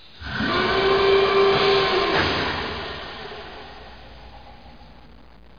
amb machine

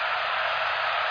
cheer low; Kingpin

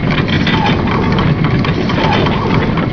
huge wheel; objects; Heretic II Demo

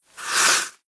make sandals; Immortal

